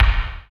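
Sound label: BD, bass drum; Sound & Vision - Gigapack I; DANCE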